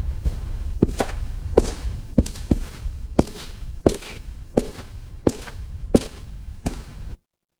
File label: footsteps concrete